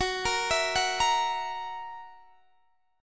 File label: Gb 7th Gb down Guitar Open D, chord